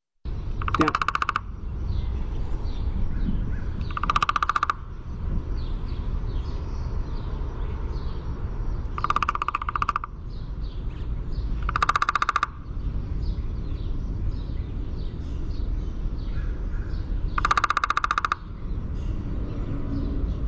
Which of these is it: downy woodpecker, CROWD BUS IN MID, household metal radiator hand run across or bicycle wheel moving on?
downy woodpecker